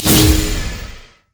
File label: double attack; LoL; monkeyking